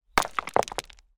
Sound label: impact rock large rockpool dry